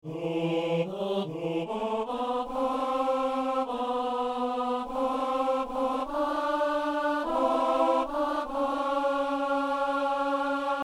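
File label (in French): ténor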